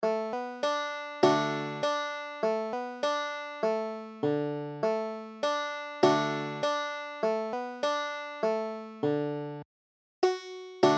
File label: banjo